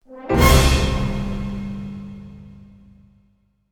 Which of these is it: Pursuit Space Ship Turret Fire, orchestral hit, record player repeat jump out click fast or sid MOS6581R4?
orchestral hit